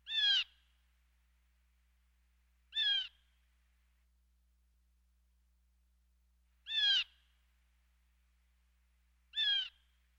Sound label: Blue Jay; BirdCalls